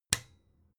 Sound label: desk lamp off hard